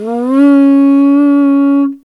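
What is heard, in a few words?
Afro Horn Phrase; Roland LCDP11 Africa VOL-1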